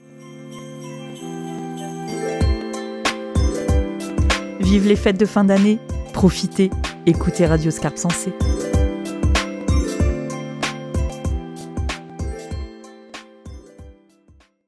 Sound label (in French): JINGLE Profitez